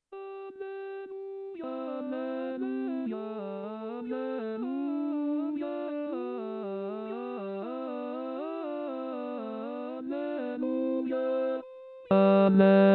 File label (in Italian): Inno Di Pasqua bassi; Bach